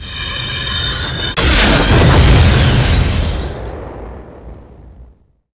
cryoban explode

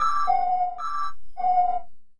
ui quest destroyed all

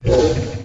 lizard attack light; tanray

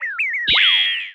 lava mining attack heavy

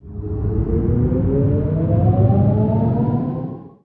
windup

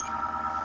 generic armed

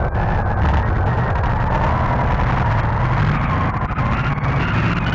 eng accel dmg

